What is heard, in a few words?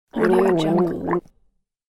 gargling female short